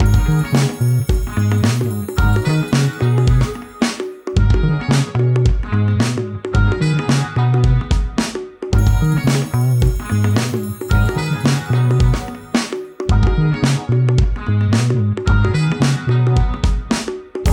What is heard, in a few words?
slow; yuttari sound